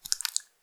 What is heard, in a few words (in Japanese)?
膣内射精後, 引き抜かれた瞬間; ■挿入or引き抜く